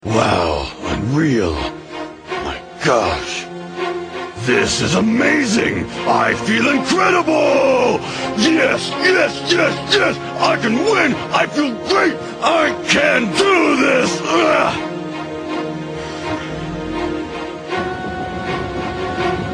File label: Motivational Piccolo